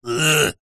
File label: human male middle aged says "ugh"; zapsplat